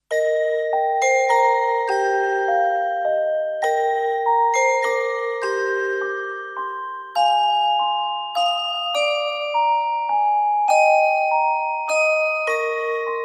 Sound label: Stille Nacht, Carillon Theme No Pad